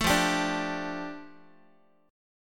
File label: F 6th Add 9th F down Guitar, chord